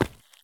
sprint asphalt; Escape from Tarkov

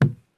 landing wood; Escape from Tarkov

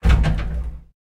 turret klank, tanks

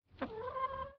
sleeping; Rust

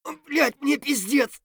hurt near death; Escape from Tarkov; scav3

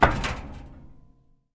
sprint metal; Escape from Tarkov

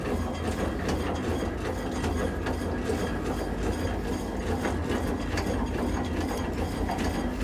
turret; World of Tanks

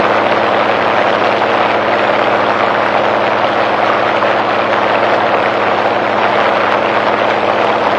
engine plane far; engines; War Thunder

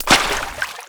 foot water shallow, footsteps

